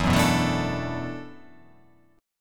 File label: E 7th Suspended 2nd Suspended 4th, down